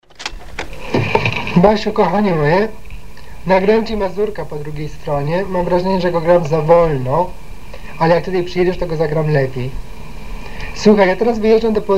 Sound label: mazurka intro, pianist